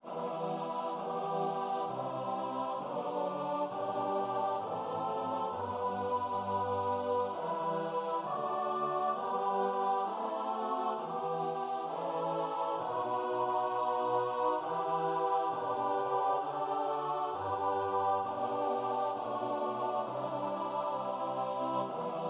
choir

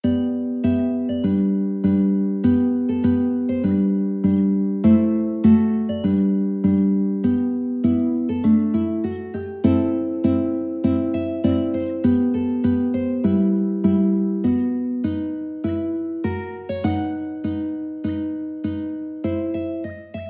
mandolin

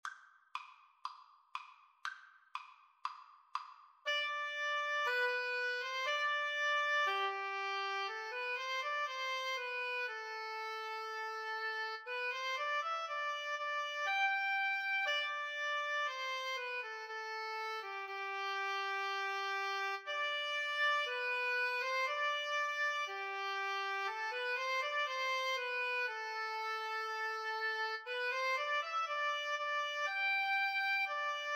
handel conquering hero, Oboe Cello Duet

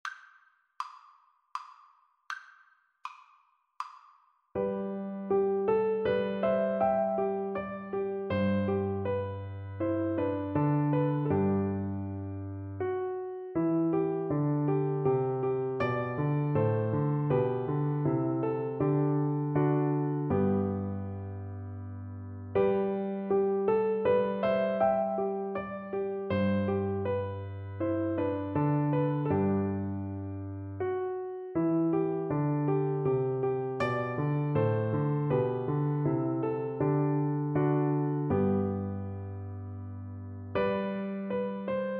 cello; gluck; dance

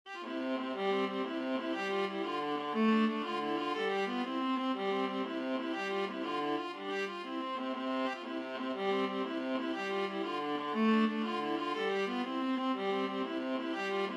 irish jig medley viola duet

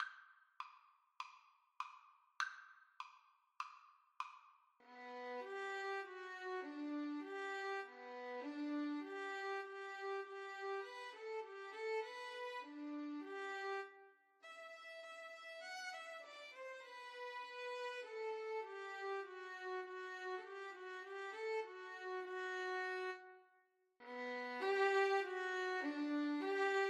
military sinfonia violin duet

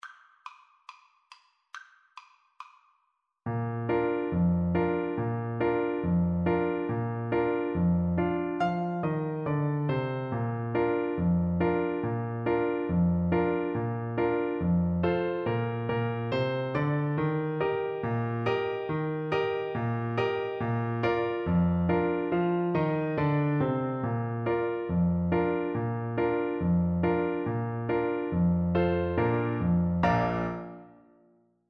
Bassoon

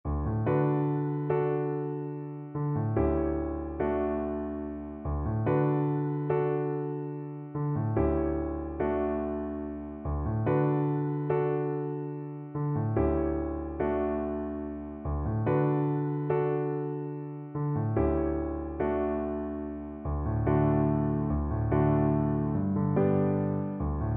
abiyoyo, cello

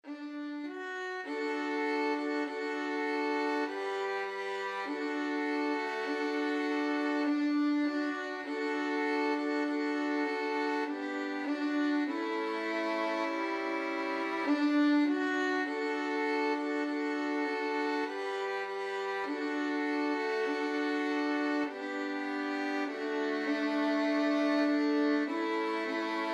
violin trio; kumbayah

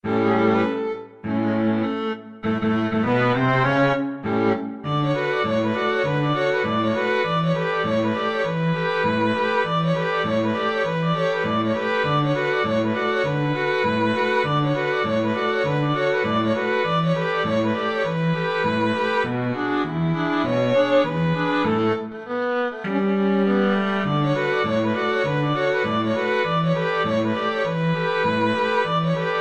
string quartet; 12th street rag

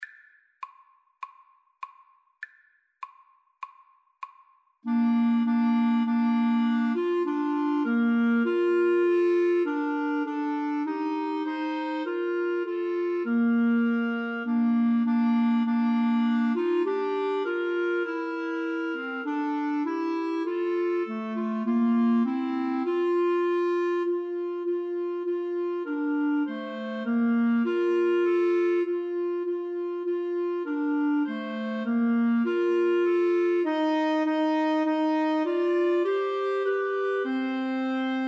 Clarinet trio

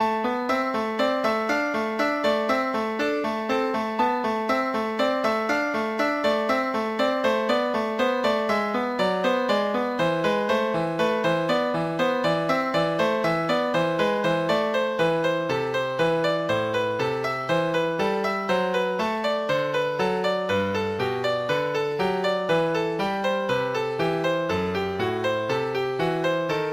bourree

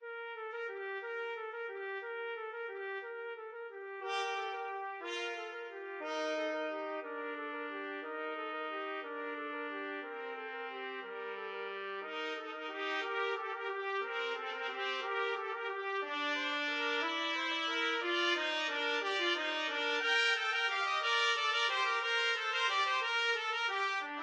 carol of the bells, trumpet duet

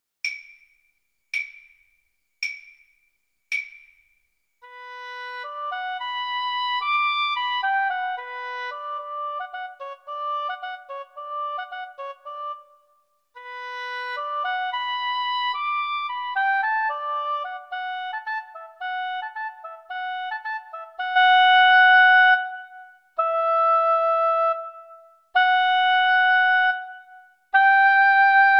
Oboe Duet

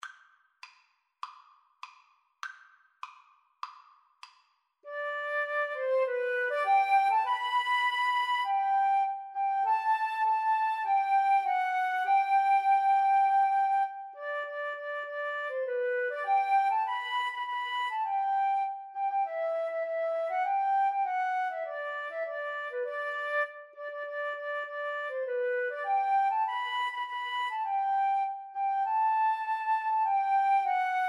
Flute Duet

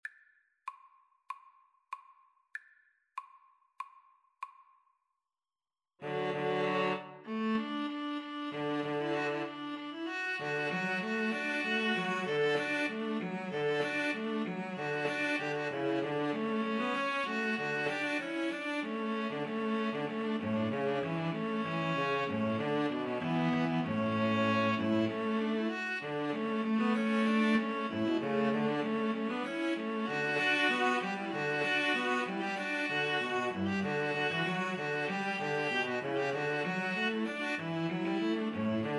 rejouissance, String trio

